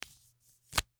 Slap bracelet, smack, slap, whip, felt, plastic, unravel, release; Eiravaein Works; Vaeyan IV